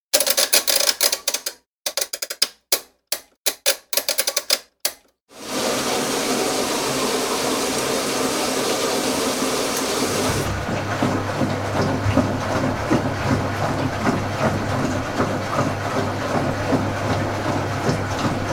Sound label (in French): machine à laver